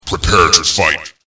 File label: fight